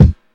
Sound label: original bass drum one shot D sharp key; kick drum